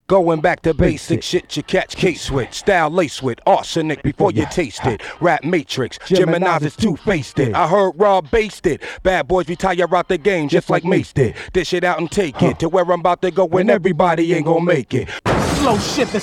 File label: accapela